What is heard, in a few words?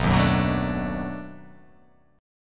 B 7th Sharp 9th, down